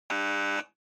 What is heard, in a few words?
Quiz Buzzer Mid